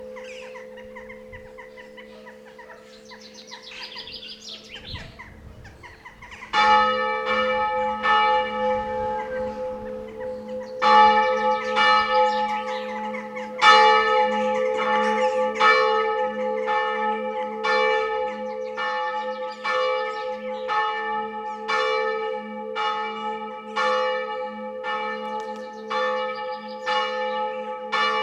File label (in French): cloches carillonnantes; st suliac; Petits moments